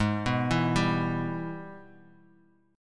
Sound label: G sharp Minor Major 7th Sharp 5th G sharp down Bass Standard; arpeggiated; JGuitar